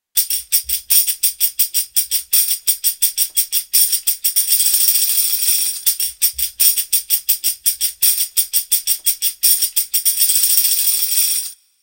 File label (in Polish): tamburyn; Nagrania Lokomotywy; edukacja wczesnoszkolna